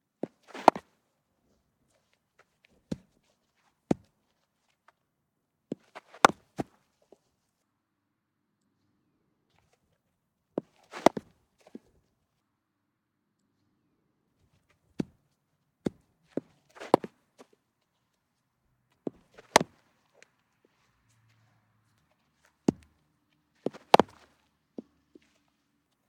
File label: Cricket; TheWorkRoom Audio Post; Cricket - Junior & Senior